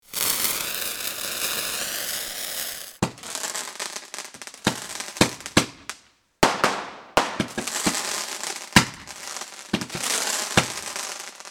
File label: Fireworks firecrackers fountain small crackle pops